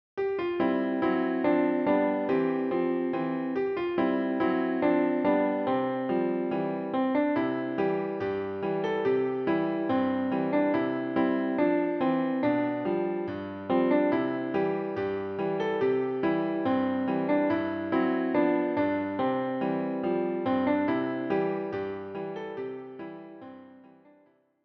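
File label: piano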